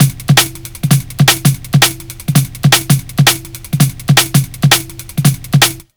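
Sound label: jungle loop, 166 bpm; Explosive Jungle; USB Soundscan vol.05; AKAI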